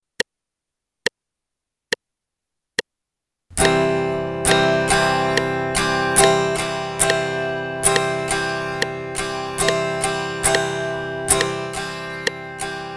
C strum in eighth notes pattern, guitar

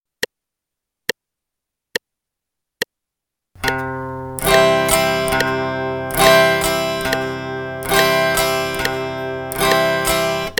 C bass note, guitar

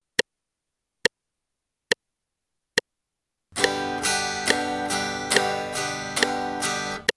Upstrokes downstrokes G, guitar

